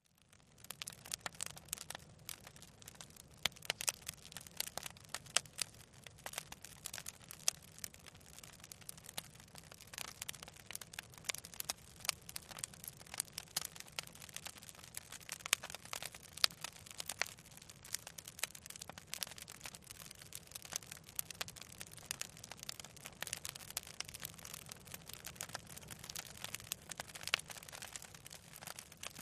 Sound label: Small Crackling Fire, fireplace